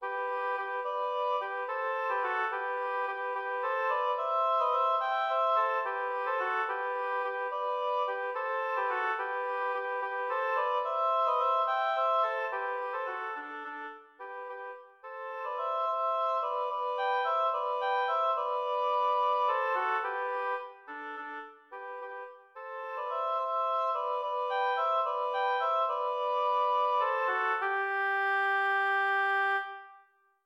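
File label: Intermediate oboe duo G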